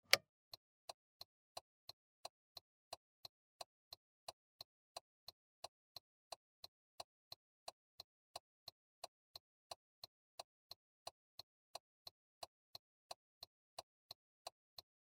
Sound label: Car Turn Signal